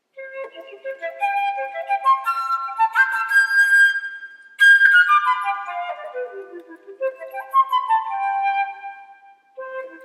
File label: chromatic and chord etude